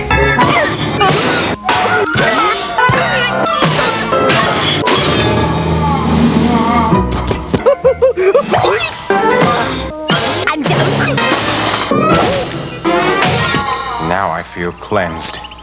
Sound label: Hits